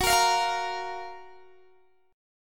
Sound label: Gb Major 7th Gb down Guitar DADGAD, chord